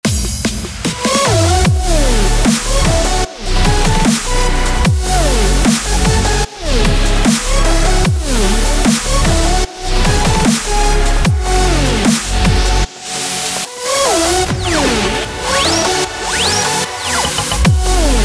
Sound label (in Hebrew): מלודיק דובסטאפ